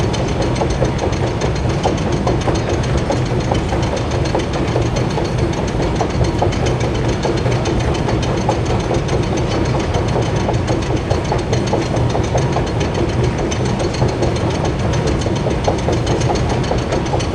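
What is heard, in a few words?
Assembly Conveyor Move Distant; Boomtown; Titanfall 2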